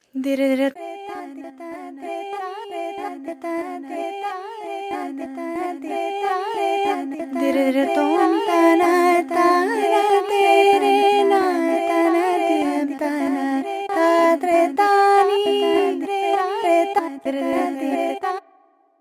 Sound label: birds; Her Stories